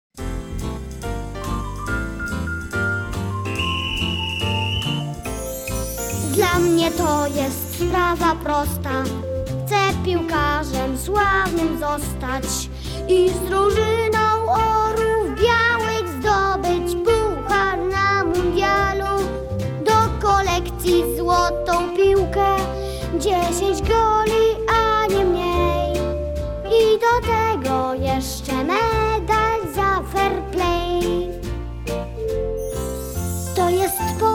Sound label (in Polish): wersja wokalna